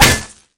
axe impact world, weapons

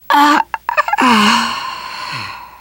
death scream, teen girl; player; survivor; voice

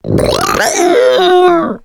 female boomer lurk

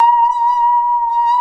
saw danger, music, zombat

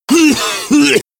cough, survivor voice biker

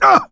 hurt major, coach; survivor

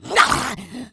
rage, infected, female

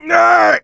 hurt critical, coach; survivor

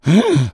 become alert, infected

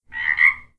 frog, frog groups

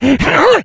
rage, infected, male

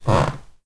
wood creaks; random amb sounds

